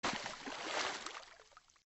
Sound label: Falling fruit in water